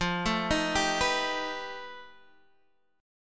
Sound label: F 9th Flat 5th F, chord